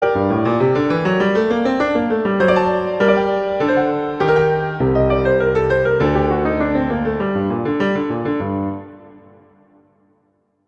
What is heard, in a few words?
Prelude - G minor; Clementi; Preludes